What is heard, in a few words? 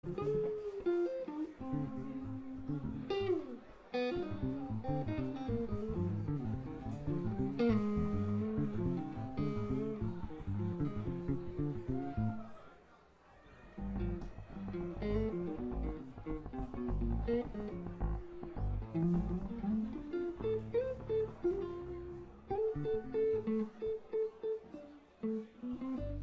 tuning